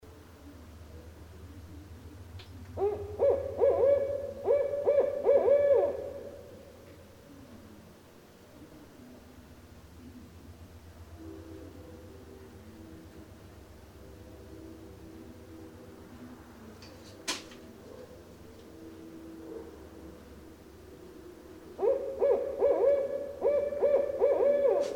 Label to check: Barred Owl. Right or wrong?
Right